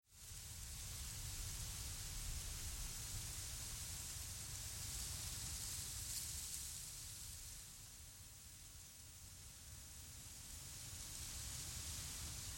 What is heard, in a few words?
leaf